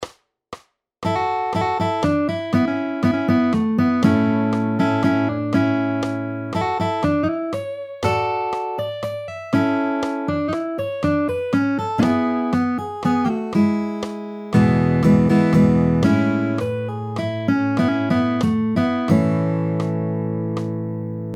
Classic R&B Style Guitar Routine, Key of A, 120bpm